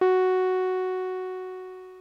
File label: ebm, Omnichord; OmnichordGodot